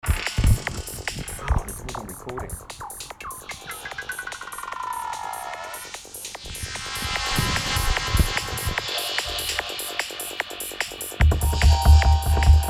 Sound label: techno roof, North India